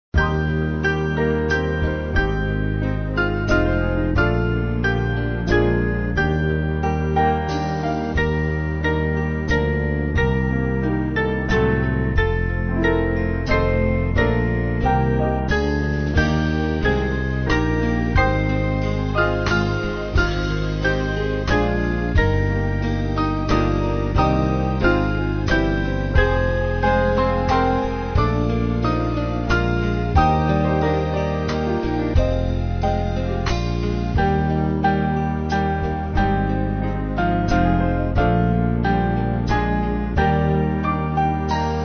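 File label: Band, Music Backing; Teach Me Thy Way; Path Divine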